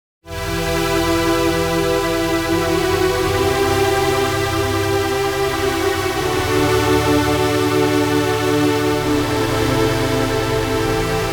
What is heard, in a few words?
Club Strings, Korg